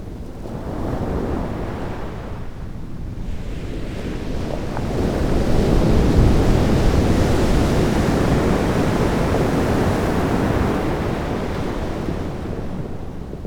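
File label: Malibu waves, ocean & brook; SFX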